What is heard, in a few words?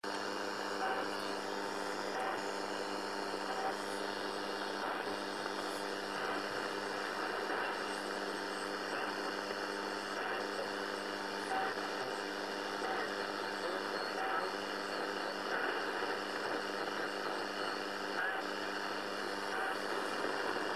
The PIP 3756KHz; Radio